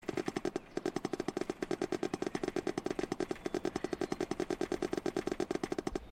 noises

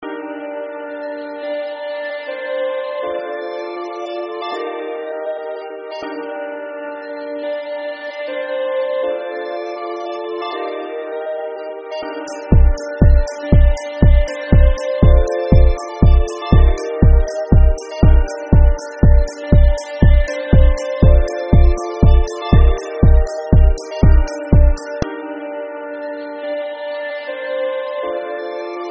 music

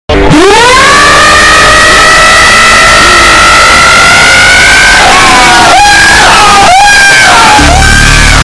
bird scream; power up meme

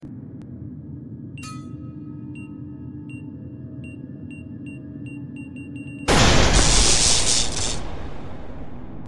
grenade explode; scpsl

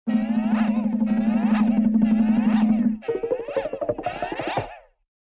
silly springs and log drum beats

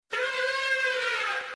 elephant

